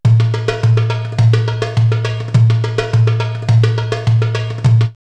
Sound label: Darbouka 104, drumloops World Ethnic; X-Static Goldmine